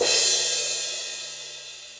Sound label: Crash 18 Bright Room, Cymbal; Drums; Drum Hits; Standard Hits; Session Drums Studio